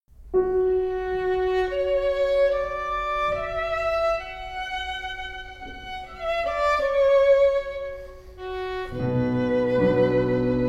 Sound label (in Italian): tenore